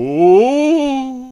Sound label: Healer Attack Vocals